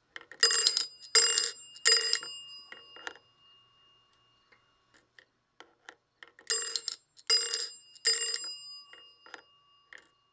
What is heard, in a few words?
bells only, projector sounds